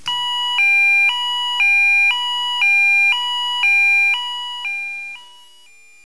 Tone Alternating 800-970 Hz at 1 Hz (500ms - 500ms)